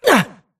grunt hits; Titanfall 2

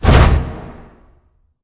sliding door sm verb open stop; beacon; Titanfall 2

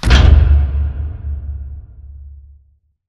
pod door lg verb open stop; Titanfall 2

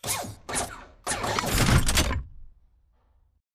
bt combat walk to idle; Titanfall 2

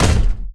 Footstep Jog Close; Titanfall 2

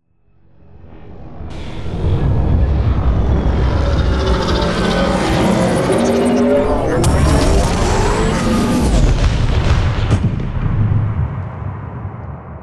takeoff; Beacon; widow; Titanfall 2

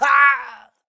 grunt death quick; Titanfall 2

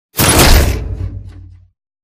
lever; Commander; Titanfall 2

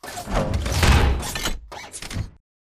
melee high punch forward; Titanfall 2

